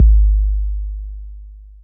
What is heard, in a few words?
BD 808 Smooth